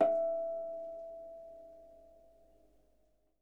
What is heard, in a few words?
H E5, Percussion & Harp; Miroslav Vitous